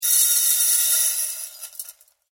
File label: pan pour